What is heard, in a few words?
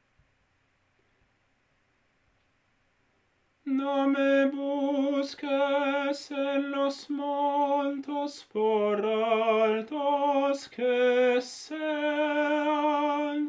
tenor; esta tierra